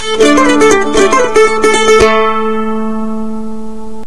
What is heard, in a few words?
One Piercing Note; RuneScape 3